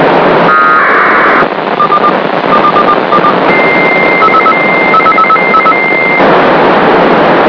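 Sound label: satellite morse; PCSat NO-44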